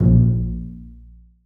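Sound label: basses pizzicato a1; Sonatina Symphonic Orchestra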